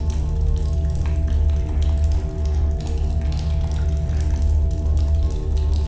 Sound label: Amb Eerie Cellar Loop; Lucius III; pc linux